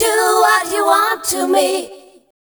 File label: FEMALE CHOIR, Dance Vocals